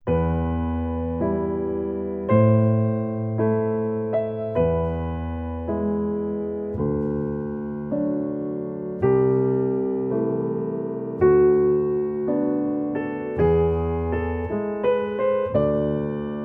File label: (Instrumental)